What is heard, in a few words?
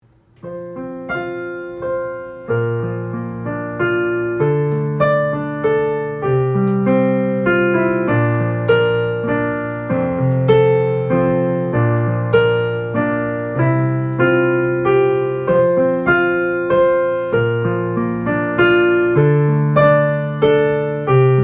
Quick Piano Thing